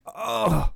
Aagh Male

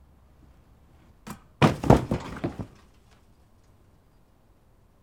Small Couch Falling Off Balcony

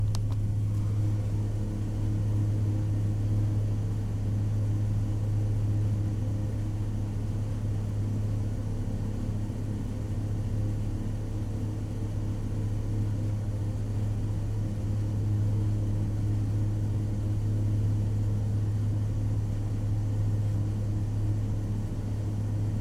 Bathroom Fan

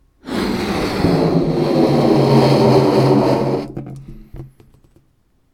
Balloon Inflate

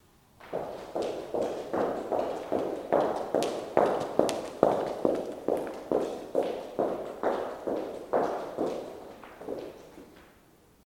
Highheels quick

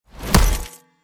Face Punch; Imperius

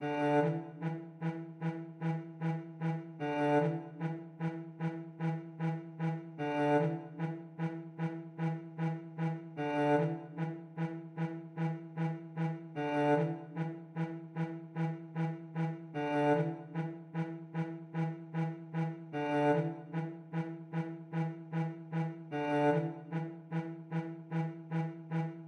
Cello Loop

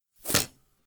Arrow Impact

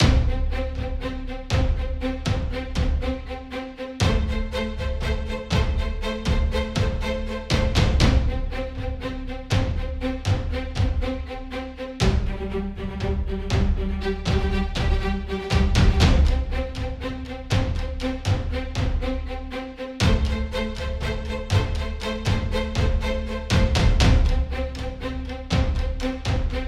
Dramatic Drums And Strings 120bpm

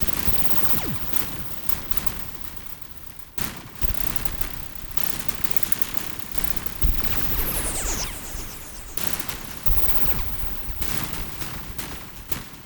Hyperspace Melody

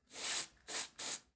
Spray

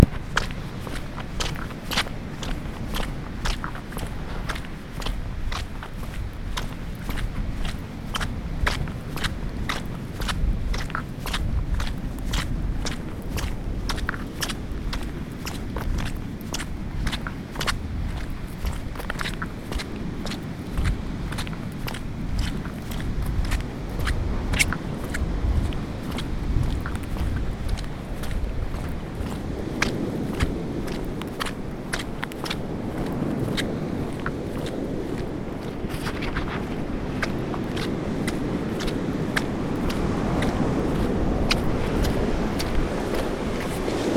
Steps In Mud

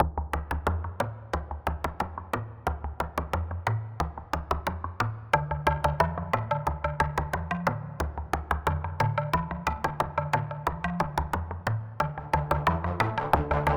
Increasing Drama

